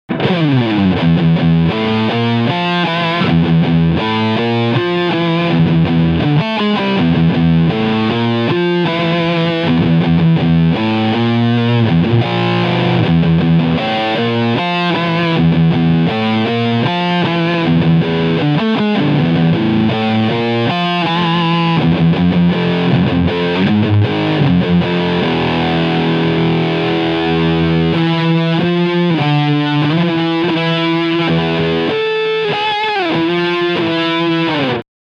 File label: Mythos Pedals Golden Fleece OD Channel Gain 12 Uhr